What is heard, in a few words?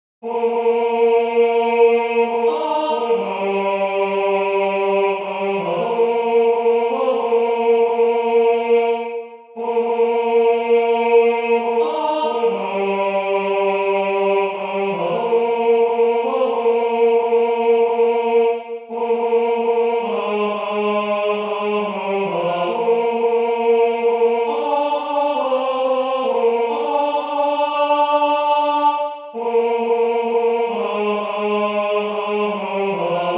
Training Tenors